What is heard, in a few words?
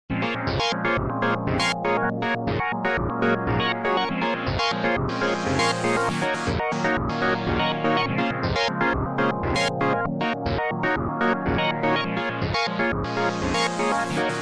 synth; LoopMangler